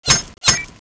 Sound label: crow draw; zmall